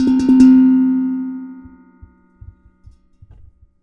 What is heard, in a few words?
Bells